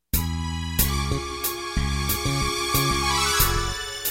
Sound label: bass string